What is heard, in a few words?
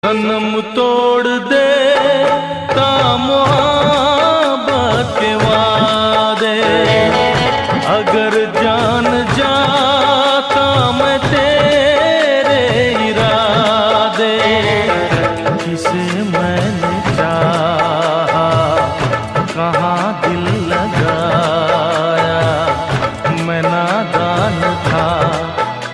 sad emotional